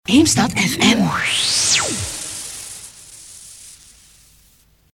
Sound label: sweep; radiopiraten; Eemstad